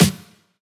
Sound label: soft hit normal, Taiko; osu